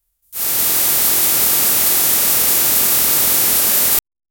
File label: simulate a queue sound, anxious